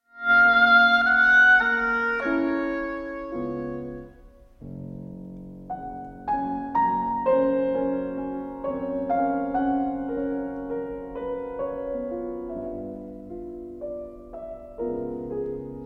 scherzo tres anime sonata for oboe and piano; francis poulenc

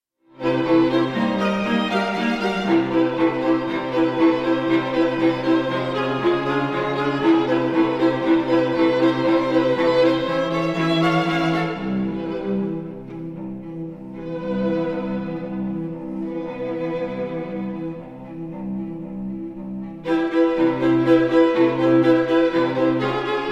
string quartet; 2nd mvt

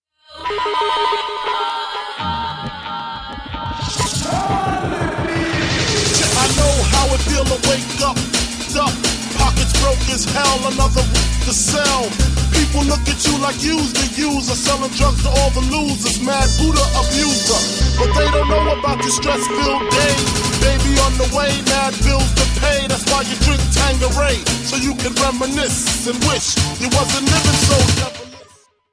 drum bass remix

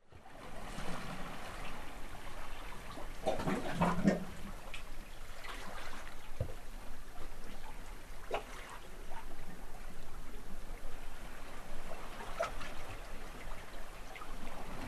common seal; harbor seal

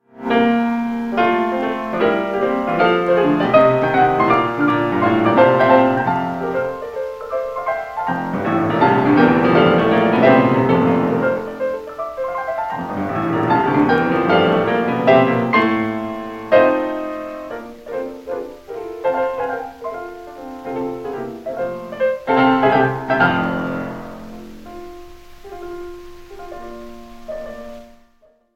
piano; Ludwig van Beethoven; sonata no 28 in A major op 101; 2nd movement

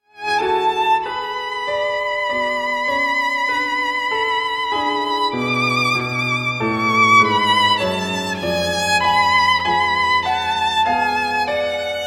largo for violin and piano; francesco maria veracini

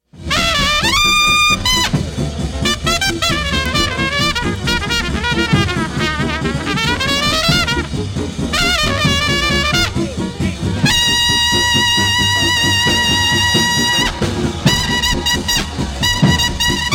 trumpet battle

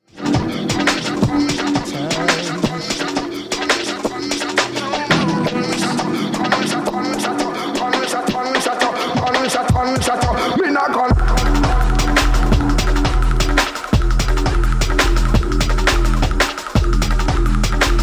machinedrum gunshot

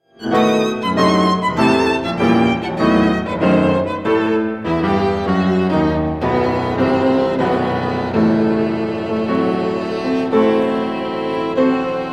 sonata in E minor for violin and piano; op 82

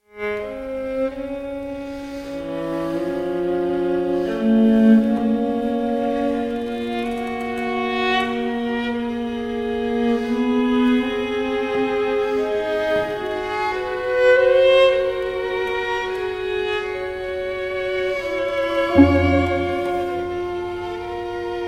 string quartet in e flat major op 127; ludwig van beethoven

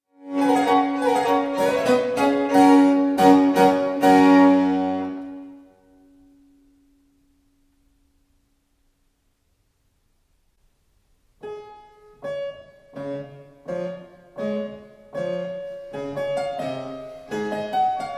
sonata in G major for violin and harpsichord

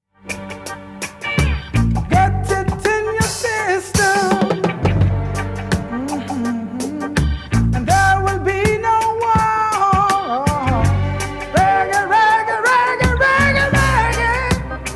reggae music

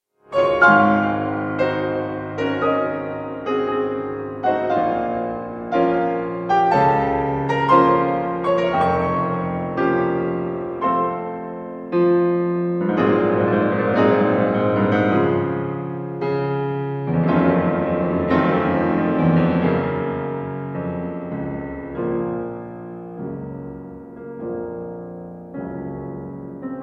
sonata in B flat minor for piano; sonata no 2

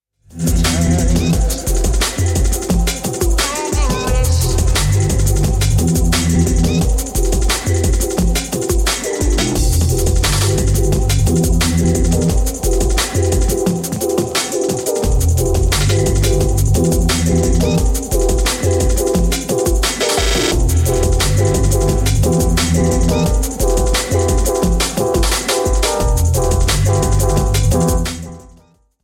machinedrum gunshot

